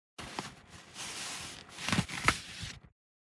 grabbing

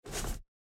Cloth movment

